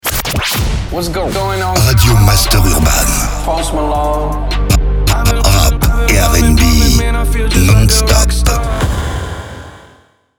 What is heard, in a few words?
JINGLE RADIO; RADIO MASTER URBAN; Habillage